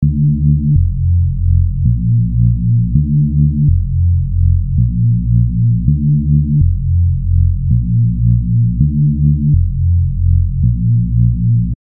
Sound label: Reese Bass Sound; Mastrcode